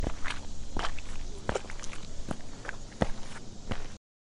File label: footsteps mud